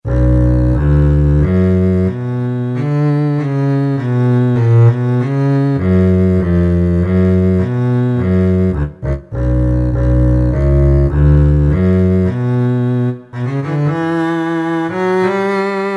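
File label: Arco Double Bass; Kurzweil K2000 Orchestral ROM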